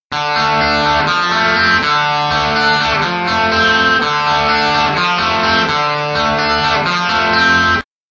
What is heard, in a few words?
hard pickin; hard pickin, again in d; guitar